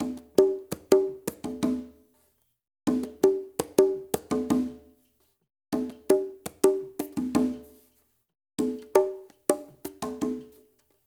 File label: CONGAS R; Spectrasonics; Liquid Grooves 1 & 2; SAUNTER